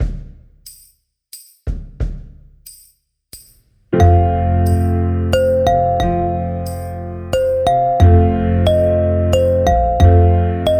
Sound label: plastic bottles